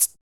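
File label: vox percussion, Vocal Planet Jazz FX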